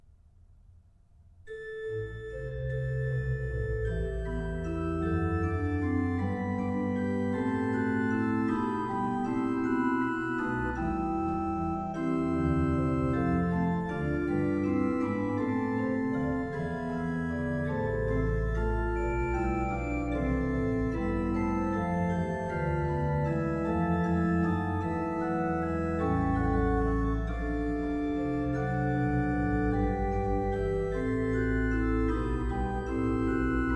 Jesus Christus, unser Heiland, BACH ORGAN, Chorale preludes; Orgelbüchlein